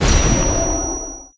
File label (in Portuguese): EXPLOSAO FLASH